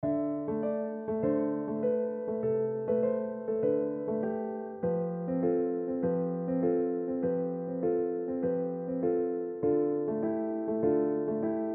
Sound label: piano